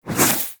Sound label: Warfan Whoosh, Weapons Melee; Warframe